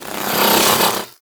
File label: Bow Draw; Weapons; Warframe; TheNewWar; Omicrus